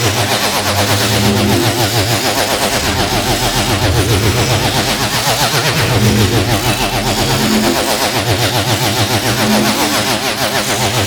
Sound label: Vortex Ability Loop; Warframe; Duviri; Dax; Ashigaru; Abilities